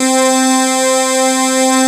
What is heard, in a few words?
JP 8 SYNS; Pro-Rec - Synthsonic